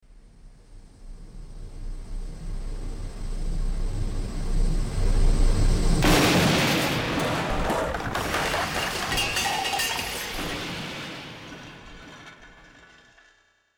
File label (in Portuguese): navio passando batendo